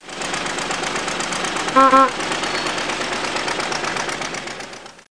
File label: CAR; Mapmaker's Toolkit; Tom Snyder Productions